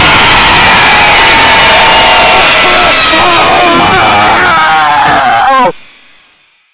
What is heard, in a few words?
jumpscare